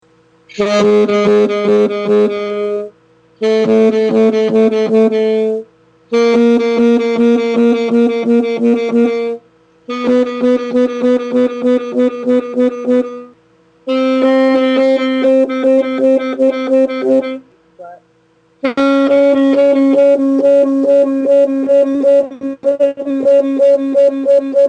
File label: tone matching